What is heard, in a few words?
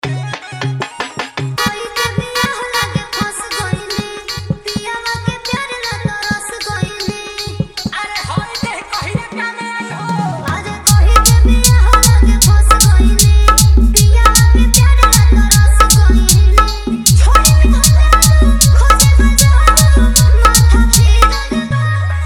Bhojpuri Dj Remix Songs Vibration Bass Mix